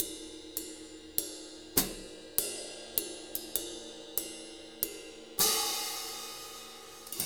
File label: 6/8 Loop, Drum Tools, Laid Back